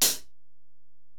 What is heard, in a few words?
HiHat, Alesis DM-PRO